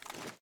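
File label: reload empty fast extended mag lift; gmod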